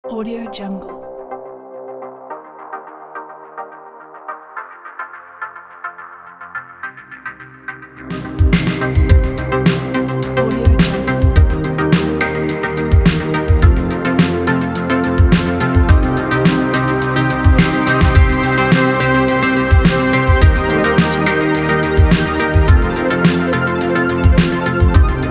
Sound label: futuristic electronic logo